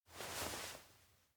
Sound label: approach; aino